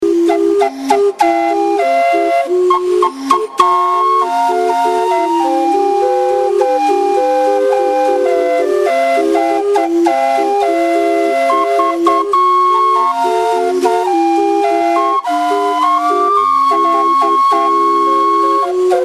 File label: calliope barge